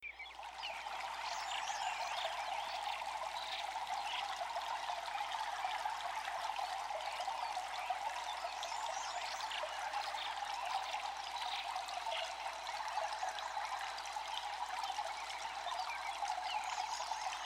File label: nature sounds